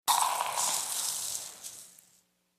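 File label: Detergent Powder Pour, kitchens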